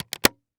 Technology Electronic Video Now Video Player Closing